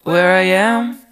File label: where i am SUNG